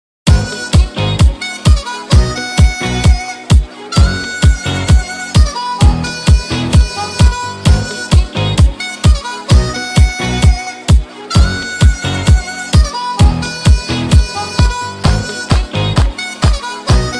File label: gymnastics floor music